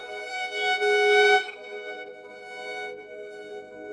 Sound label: sad violin